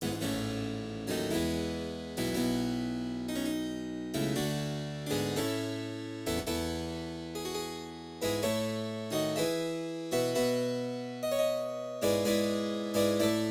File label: Toccata